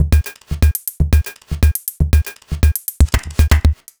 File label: Metallic Beat, Electronic Beats; Sonic Foundry (Sony Creative Software); Myths of Technology